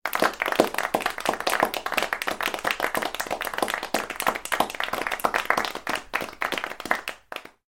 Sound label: human clapping 8 people